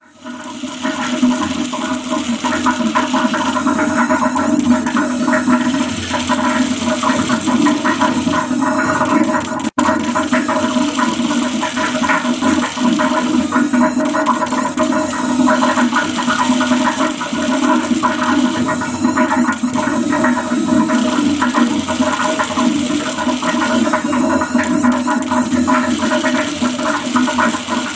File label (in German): Milchraumglucksern; alpspektakel